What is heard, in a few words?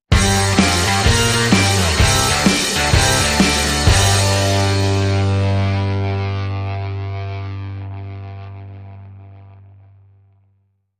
Fuzzbox, Sting